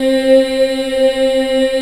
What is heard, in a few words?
C3 child EH R, Choir; USB Soundscan vol.28